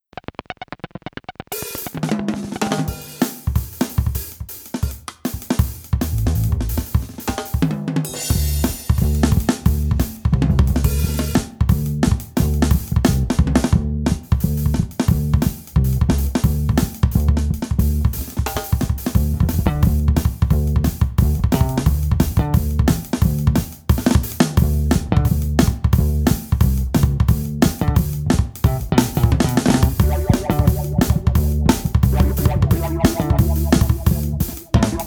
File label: Nova Bossa; josepackwood